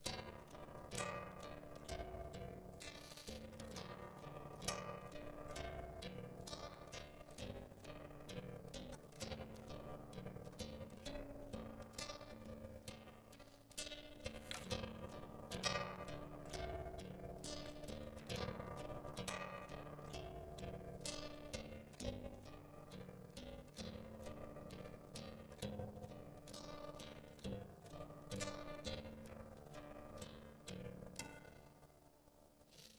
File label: 200 Hz 200-100 difference